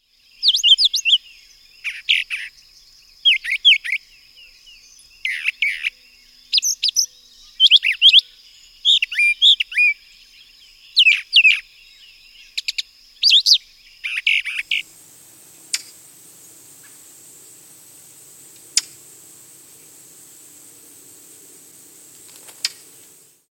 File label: Brown Thrasher